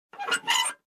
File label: Metal Cover Latch Squeaky Sound